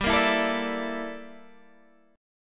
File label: G Minor 6th Add 9th G down Guitar Open G